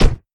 Stab; lethal company

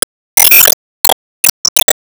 Se Enemy Rose Queen Wand Change

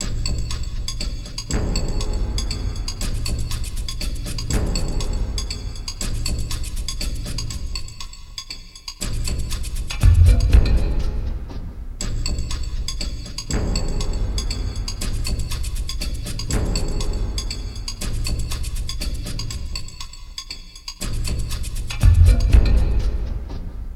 Boss combat; The Hobbit; PS2

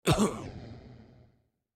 Cough

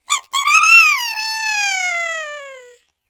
Creature; Orphaned

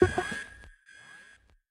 Boss Red Alert; Going Under; Winkydink

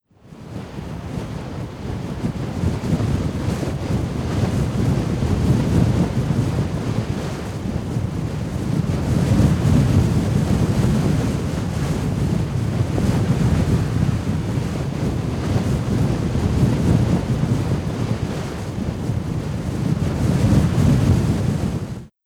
Cities nyc Gusty wind

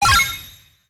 Bonus Balloon

